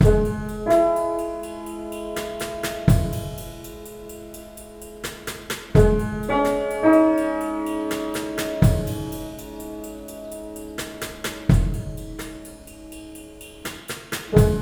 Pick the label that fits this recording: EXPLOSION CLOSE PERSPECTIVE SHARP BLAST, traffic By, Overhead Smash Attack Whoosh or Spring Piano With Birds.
Spring Piano With Birds